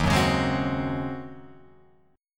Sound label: Eb Minor 13th Eb down Guitar Open F; Ebm13 strummed; JGuitar